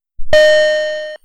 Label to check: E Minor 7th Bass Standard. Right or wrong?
Wrong